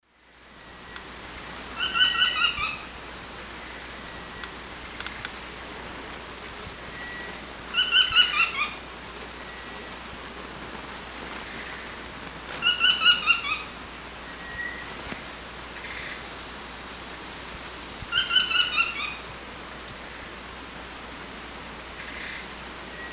Automolus ochrolaemus, song; S. America; Eastern Lowlands

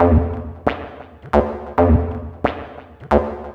Synth, Techno

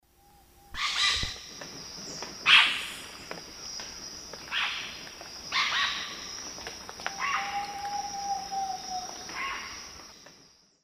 Cacicus haemorrhous, flight calls; S. America; Eastern Lowlands

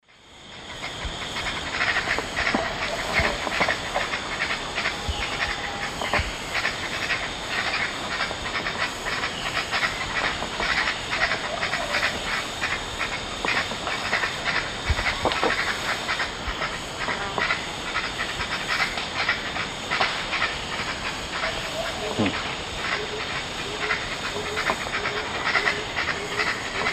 Pteroglossus azara, group calls; S. America; Eastern Lowlands

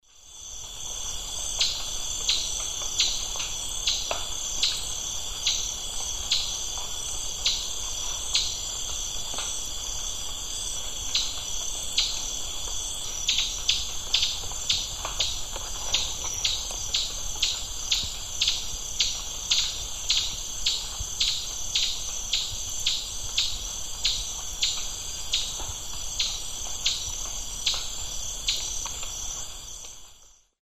Topaza pyra, calls or song male; S. America; Eastern Lowlands

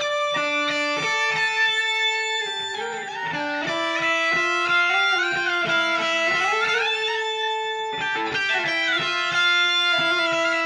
Shift Delay Guitar; Guitars

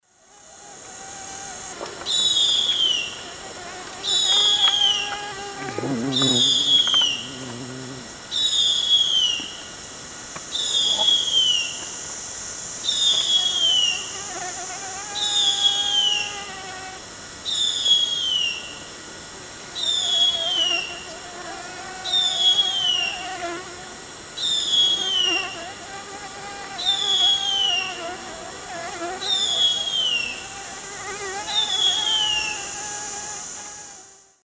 Harpia harpyja, begging calls; S. America; Eastern Lowlands

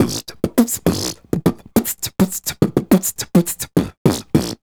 HIP-HOP R&B VOCALS

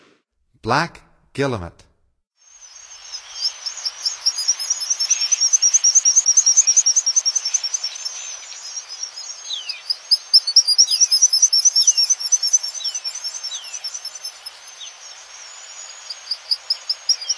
Black Guillemot; North America; Stokes

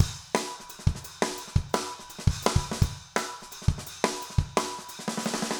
jungle 170

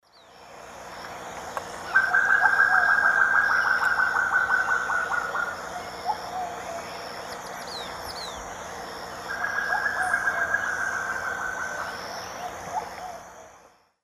Chamaeza nobilis, alarm calls 2 birds; S. America; Eastern Lowlands

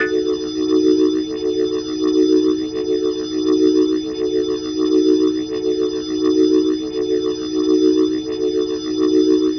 Glowing Organ D# Dim, Hip-Hop Organ Licks

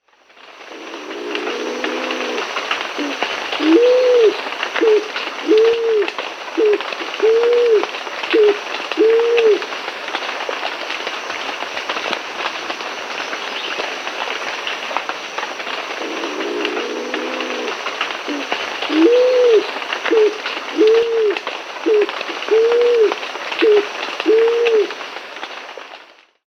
Scaled Pigeon (Columba speciosa), Peru; S. America

